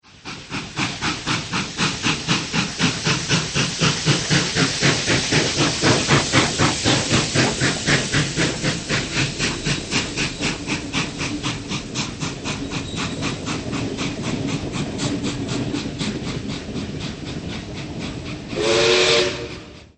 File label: steam, trains; STEAM LOCOMOTIVE